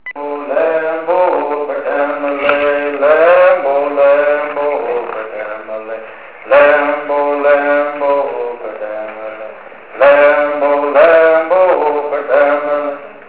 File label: Bird